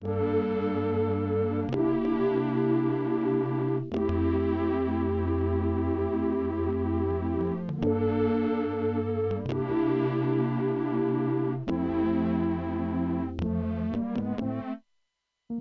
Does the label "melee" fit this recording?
No